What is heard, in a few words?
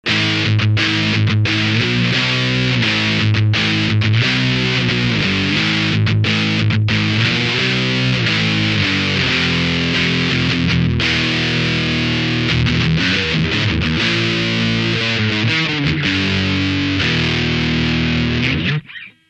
ft lead